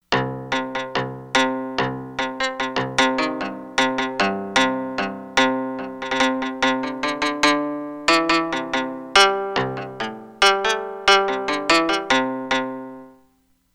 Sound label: Biwa, Korg MSC-13S Ethnic